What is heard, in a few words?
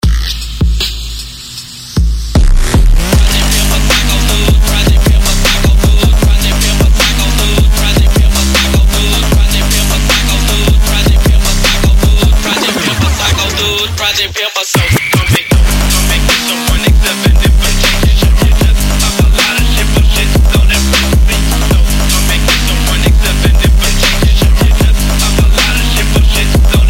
Drum Kit; Nortmirage